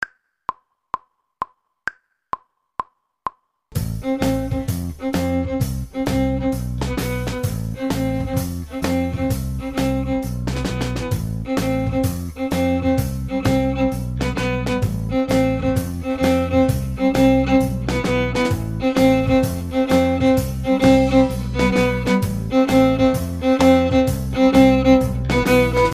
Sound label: viola